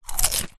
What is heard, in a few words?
human eat popcorn